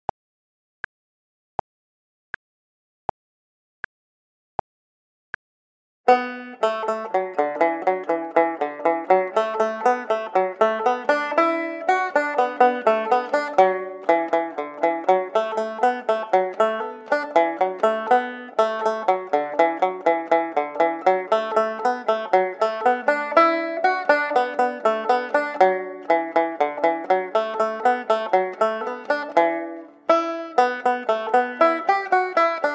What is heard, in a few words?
jig